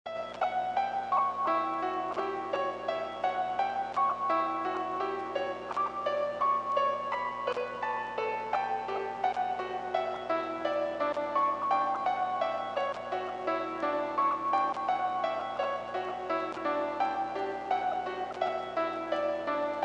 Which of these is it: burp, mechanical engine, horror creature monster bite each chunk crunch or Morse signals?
Morse signals